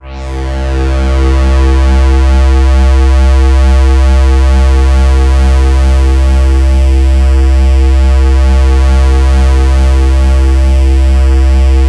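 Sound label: PAD C2 right, Jungle; USB Soundscan vol.05 - Explosive Jungle; AKAI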